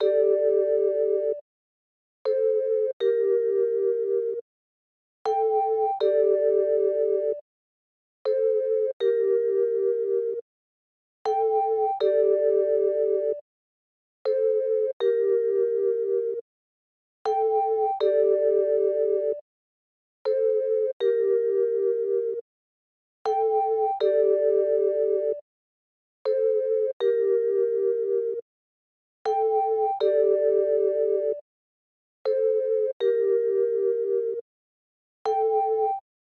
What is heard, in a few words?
80 BPM G Min Mellotron; Loop Stems; Cymatics; Harmony